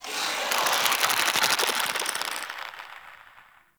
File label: Tendril Tree Creaks, Infested; Ambience; Props; Warframe; Infested Eris